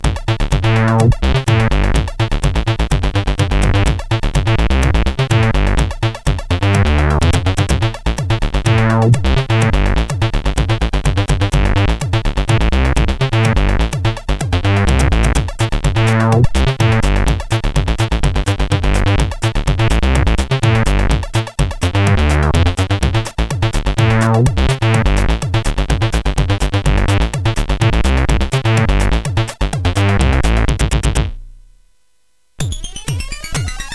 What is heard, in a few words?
Rap n Roll, sid CSG8580R5; hvsc